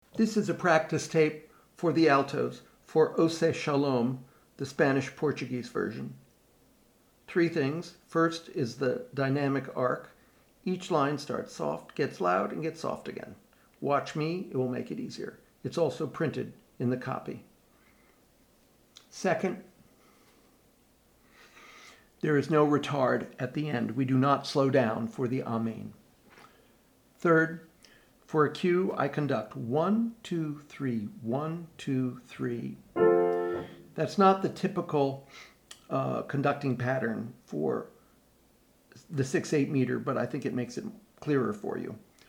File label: alto; Oseh Shalom; Spanish Portuguese